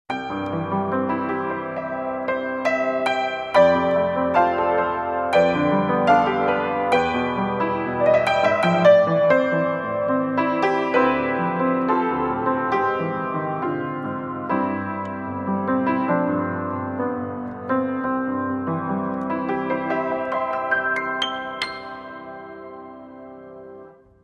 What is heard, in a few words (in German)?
verstimmt, Klavier